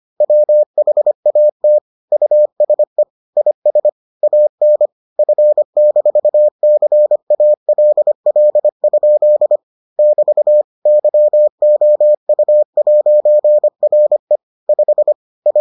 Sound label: morse